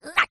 cartoon small character high pitched voice fight vocalisation